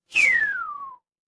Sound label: cartoon slide whistle